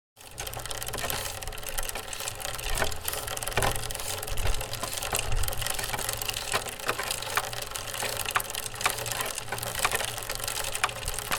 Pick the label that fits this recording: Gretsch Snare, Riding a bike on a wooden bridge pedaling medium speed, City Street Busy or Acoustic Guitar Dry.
Riding a bike on a wooden bridge pedaling medium speed